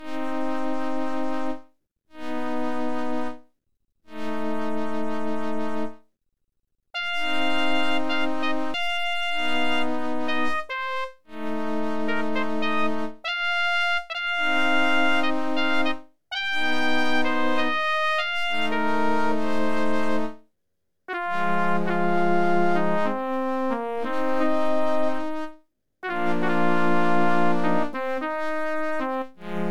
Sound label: OPL3; sim city 2000